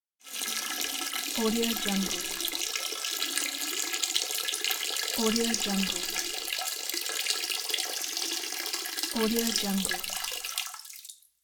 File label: urination